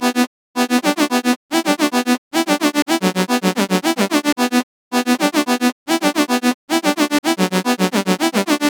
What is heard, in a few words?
Drop Loop BPM 110 E Min; Gully Loops; Shikhar; UDD Gaye Sample Pack